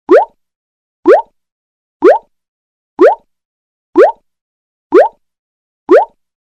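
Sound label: sound "bulk" cartoonish; Bulk multyashnyj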